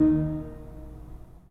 3D Pipe Mix Celeste, 3D Organs; E-MU Producer Series Vol. 5; 3-D Audio Collection

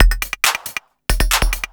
percussion loops 138, Techno; PS-45

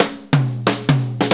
4-4 Fill, Drum Loops; Sonic Foundry (Sony Creative Software); Drum Tools; Fills

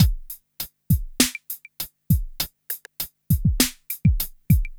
Smooth, R&B Drums

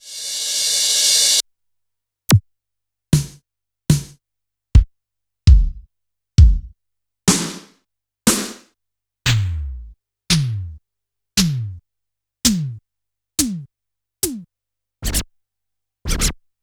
Yamaha PSR-E323 Electronic Kit

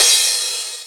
909 Crash; Quasimidi Rave-o-lution 309

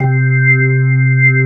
organ, 135 BPM, drum & bass; Ueberschall; Drum & Bass Flash Trackz

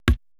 PERC MIX

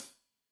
Hat Loose, Hi-Hat, Cymbals Stereo

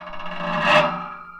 Radiator, contact mic, Metalics

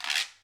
Guiro Wood; Club-50 - Foundations Roland

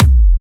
Sub Kicks, Bassdrums

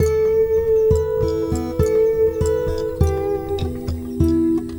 Guitar And Synth; Sonic Foundry (Sony Creative Software) - Friendly Fire

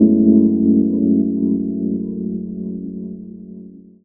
Chords Tones; Equipped Music; Loungin House

